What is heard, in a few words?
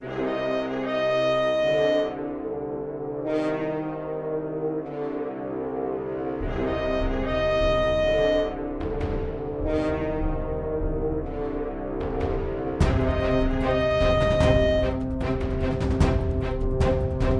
War Horns; Dungeon Heroes